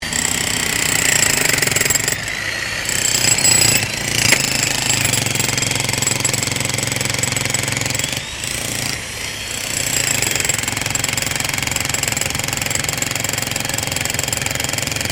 JACKHAMMER BOSCH ELECTRIC DIGGING BITUMEN STEREO, Construction Bosch jackhammer